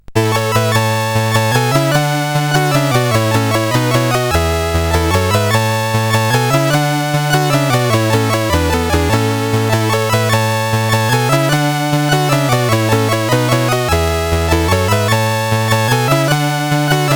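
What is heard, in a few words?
Short and Happy Gametune, MOS 8580 SID chip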